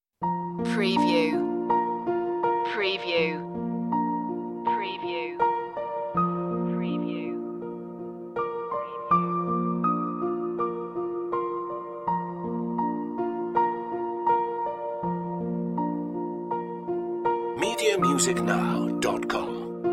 piano dances

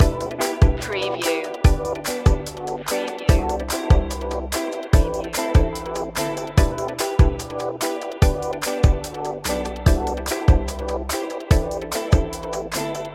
summer breath loop; Melodic electronica music and synthesizer sounds containing flowing fresh melodies.

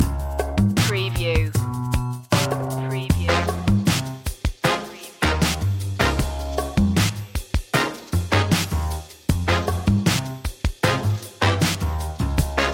dramedy street

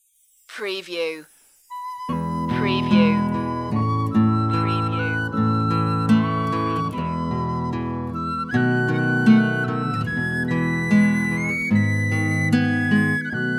penny whistle version

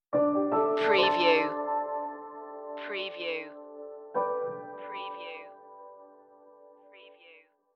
emerald cascades stinger

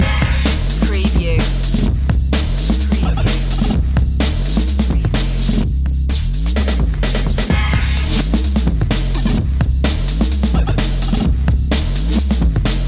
bass addiction loop

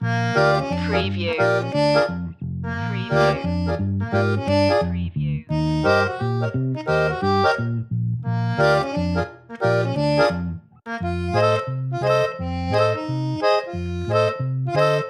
accordion

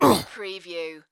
Pain Umph, Male Painful Grunt, Hurt

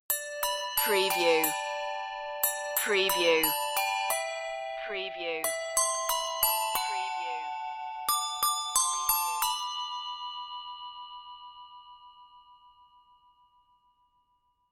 jingle bells handbells

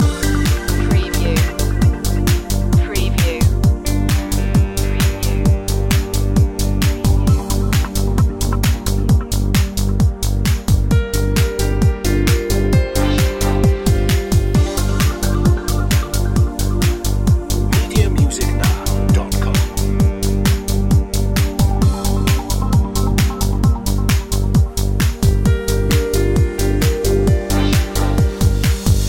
digital concepts loop

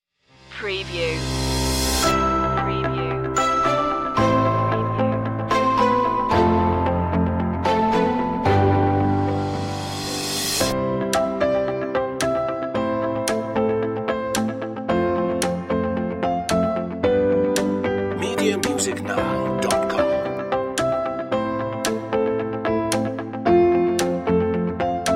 happy go lucky no bass

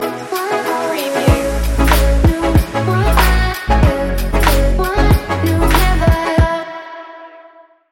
pop stinger

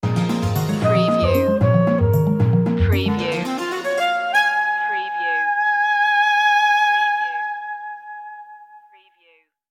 danger sting

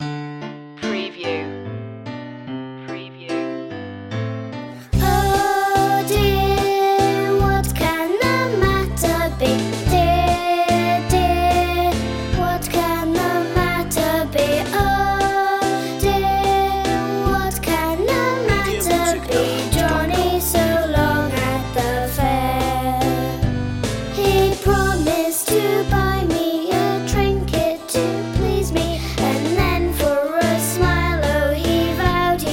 oh dear what can the matter be children vocals

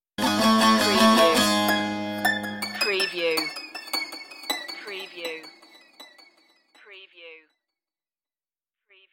eastern pulses stinger

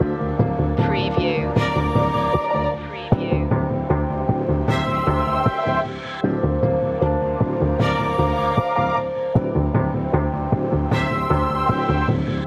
infinity trailer loop

